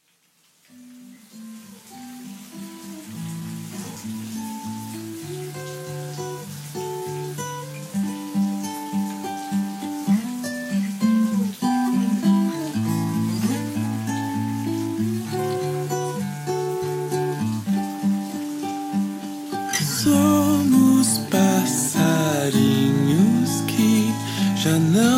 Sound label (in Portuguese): cantiga de ninar